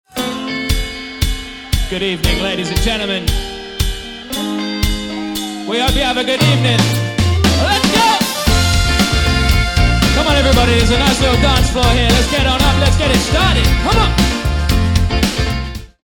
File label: Soul Band; Surrey